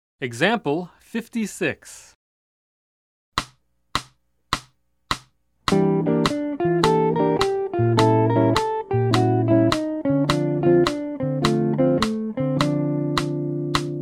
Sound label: Jazz; jue shi ji ta